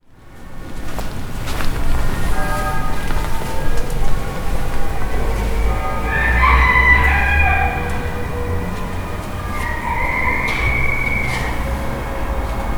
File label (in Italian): interno Chiesa Presepe; borgo sacco